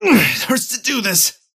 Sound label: cast pain; dota; kez